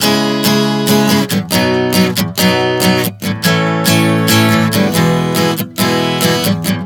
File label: C#-B-A-E 140 bpm, acoustic guitar chord progressions